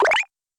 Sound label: cute motion